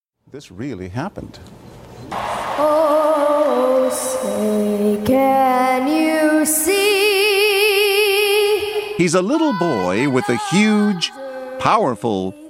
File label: mexican boy sings anthem